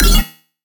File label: Bass Cile, Dubstep; Bass One Shots; Ghosthack; Dubstep Sound Arsenal; 50 Bass One Shots